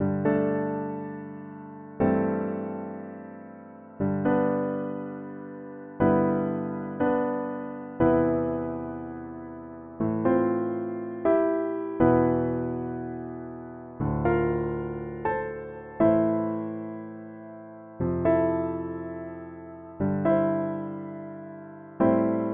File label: chords